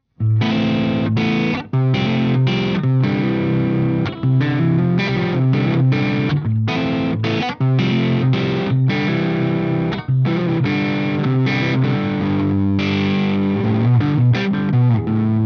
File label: FUZZ